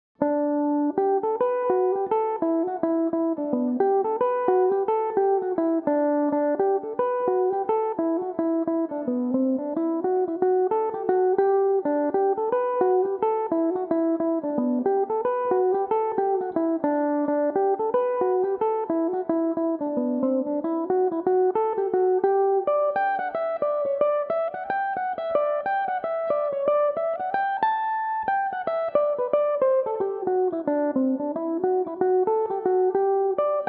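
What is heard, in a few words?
G major, mandolin; The Barefooted Master; Belmuse EM-190 electric mandolin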